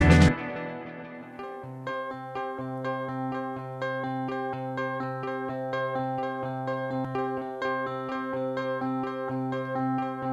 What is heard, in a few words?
Instrumental